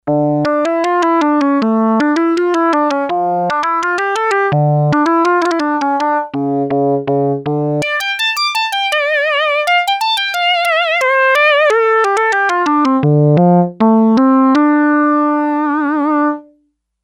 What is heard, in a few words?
Unison organ, Sequential Circuits Six-Trak; Factory Programs